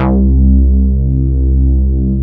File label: MOOG BASS; AKAI S6000 CD-ROM - Ultimate